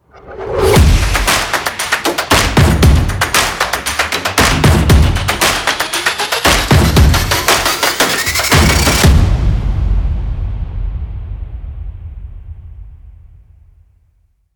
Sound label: Upbeat Stomp Clap and Percussion No Shouts